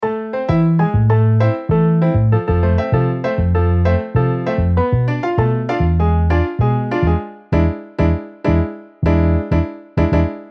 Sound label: Piano Bass MIDI